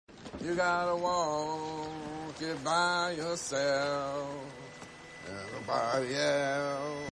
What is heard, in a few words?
singing